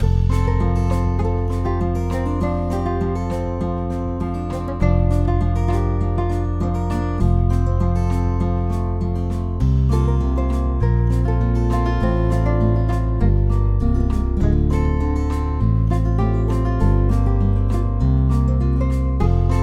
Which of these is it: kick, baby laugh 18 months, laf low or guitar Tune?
guitar Tune